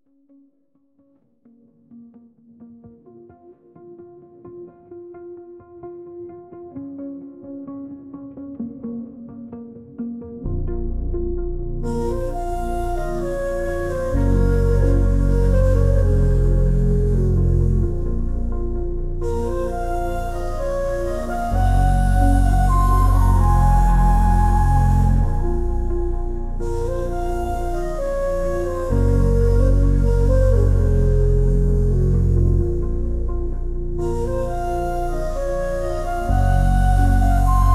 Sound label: Gritty Didgeridoo Night Chant; StockTune